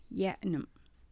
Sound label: laughing